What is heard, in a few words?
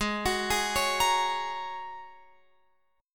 G sharp 9th Suspended 4th G sharp, down, Guitar, Open F; arpeggiated; G#9sus4